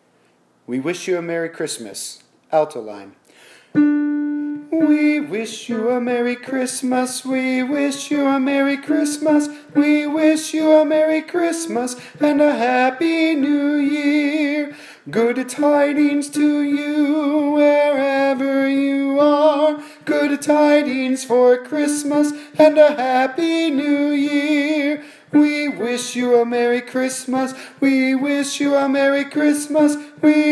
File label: alto; we wish you a merry christmas